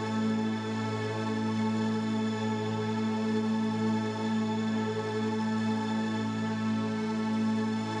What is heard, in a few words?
Drone; stereo toolkit samples; Non Tempo Loops